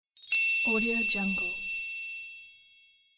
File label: the diamond sparkle; danielmix